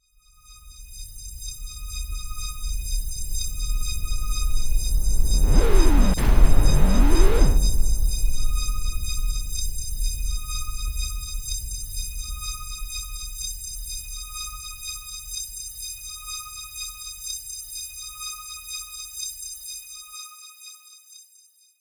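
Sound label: Reverse Boom; Solomn; Cinematic Metal Impacts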